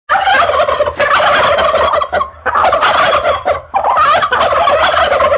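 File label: hindi zil sesi, hindi ringtone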